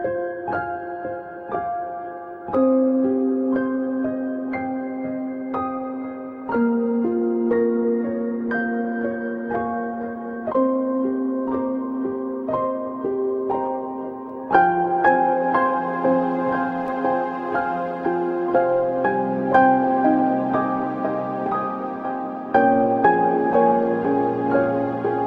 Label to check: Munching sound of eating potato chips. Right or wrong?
Wrong